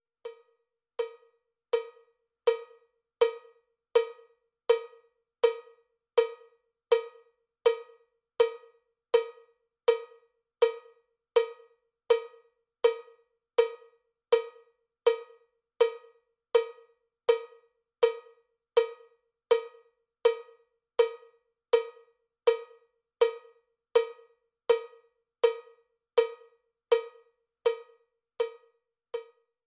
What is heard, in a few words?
cowbell click track bpm 79; cowbell click track bpm 81